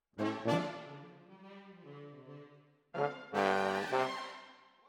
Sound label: Tromb ASS